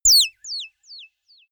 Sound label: mini laser shot; soundpool